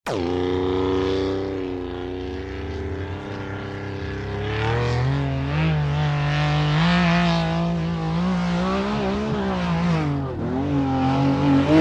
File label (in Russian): dvizhenie po grjazi